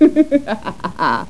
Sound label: EVIL LAF; Games.Carmageddon